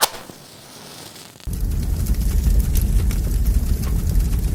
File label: match and fire